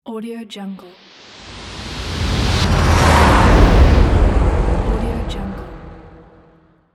car logo

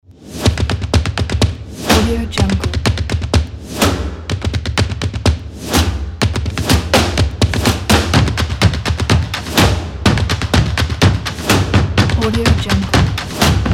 action stomp opener intro logo

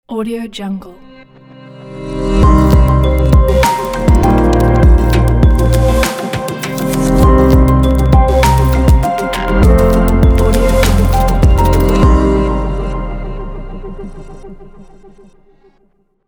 abstract glitch corporate logo intro

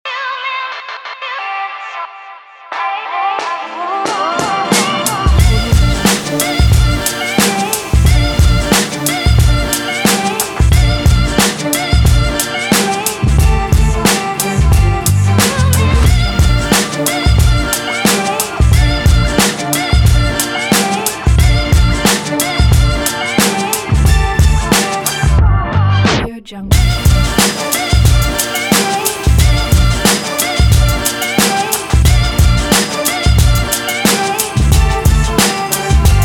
hip hop

